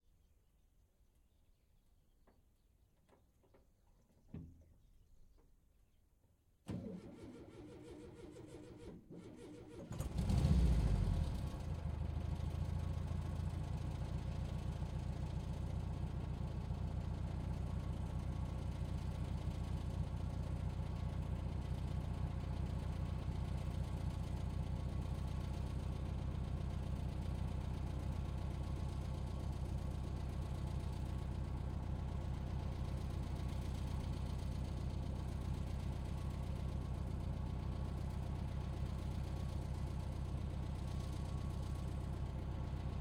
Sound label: Kubelwagen Ext Start Idle Blips Off Distant, Volkswagen Kübelwagen 1943; KMR81; KM120; Pole Position